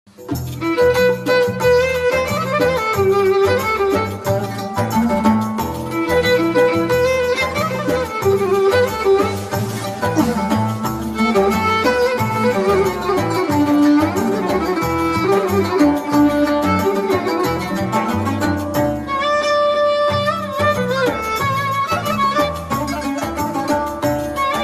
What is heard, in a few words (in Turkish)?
muzigi